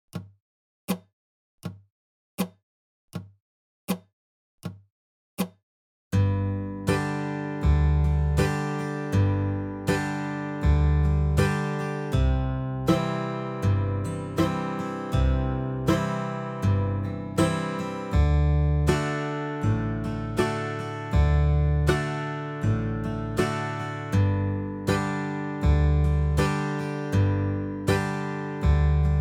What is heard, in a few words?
Key of G backing track - 40 BPM